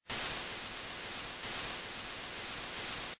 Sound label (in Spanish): tv sin señal